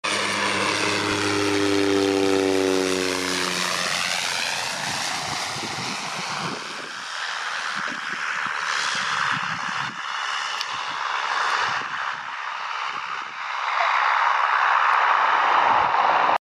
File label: Cessna plane; هواپیما سسنا